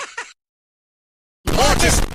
haha; mortis

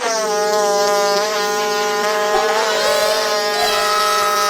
fart

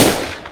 gun shoting

